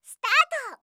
start

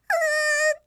"uuuu"; hidan

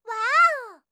waao

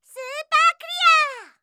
superclear

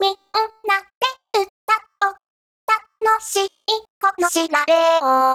staccato; utau

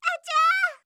achaa; hidan

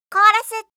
chorus

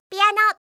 piano

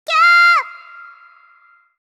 "kyaa"

